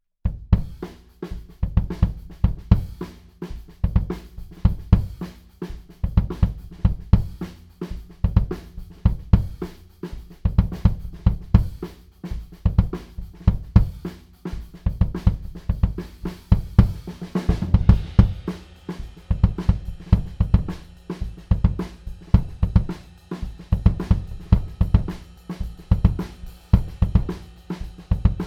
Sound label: KICK TUBE